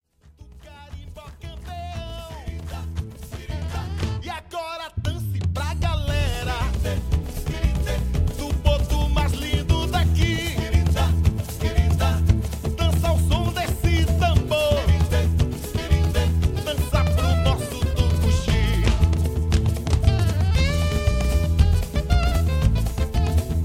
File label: Carimbó Campeão - Sax soprano solo